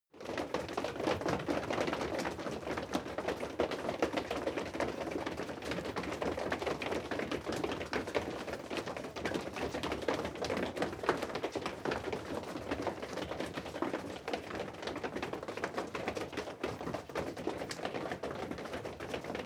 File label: step crowd run disordered sequence; schritte